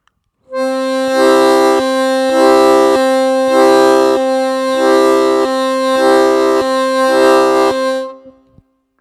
одинарный ритм на октаве +1+4, губной гармошке; «Отбивать» ритм языком; Научиться «отбивать» ритм при помощи языка

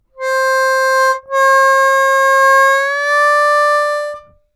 плавно в обратную сторону +4 -4′-4, губной гармошке

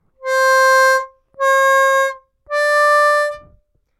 отрывисто в обратную сторону +4 -4′-4, губной гармошке

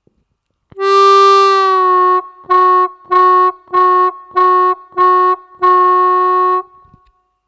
2-2′-2 играть отрывисто, губной гармошки; без плавного спуска

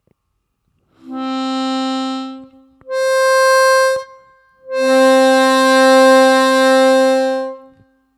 +1+4, октавы, губной гармошке; блокировки языком

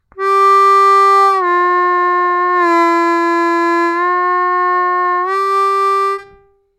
2 -2’-2’' -2’-2 плавно, губной гармошки; отработать навык игры промежуточных бендов

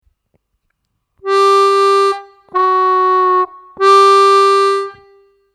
2-2′-2 играть отрывисто, губной гармошки

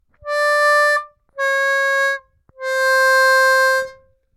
4 -4’+4 отрывисто, губной гармошке

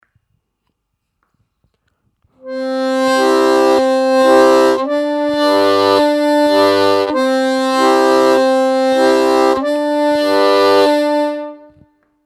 одинарный ритм на октавах +1+4 и -1-4, губной гармошке

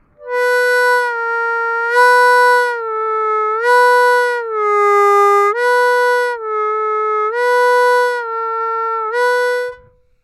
3 -3’-3 -3’' -3 -3’’' -3 -3’' -3 -3’-3 плавно, губной гармошки; наработать точность и контроль над промежуточными бендами

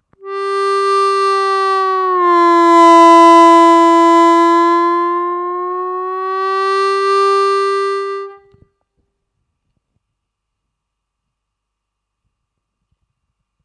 Звуковой файл для отработки бенда (в паузах играйте свой бенд на втором отверстии)

